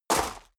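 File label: step dress boots gravel medium jump, footsteps; schritte; menschen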